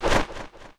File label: bird flap; HeavenStudio; dog Ninja